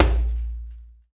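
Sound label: HAND DRUM; Spectrasonics; Heart of Africa 1; HAND DRMS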